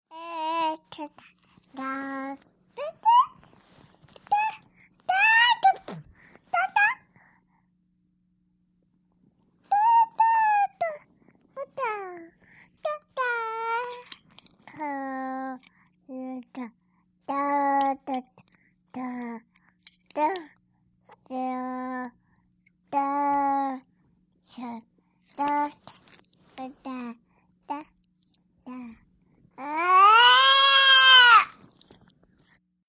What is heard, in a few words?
Happy Baby Girl Plays, twelve month old